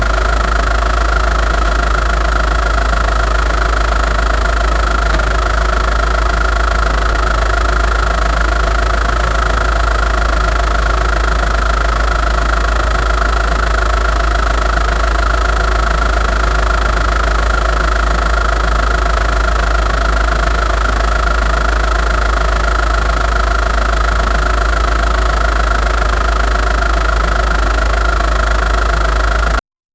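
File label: a ford focus engine running; automotive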